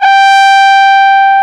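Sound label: French horn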